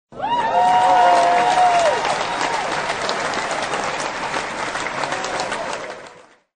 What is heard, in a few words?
clap normal